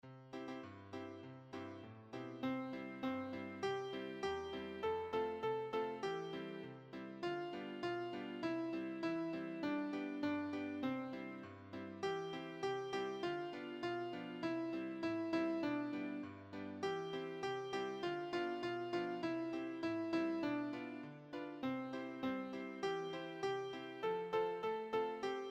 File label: Baa Baa Blacksheep Melody